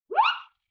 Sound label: Wind Whistle Zip; Vintage Cartoon; Musical Elements